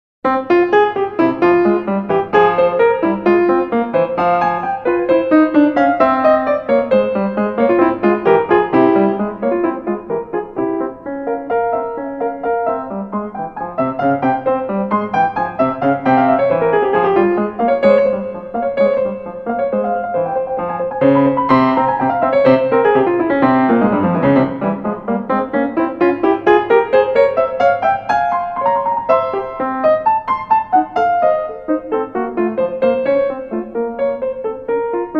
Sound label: Toccata In F Minor; Marlais Book 6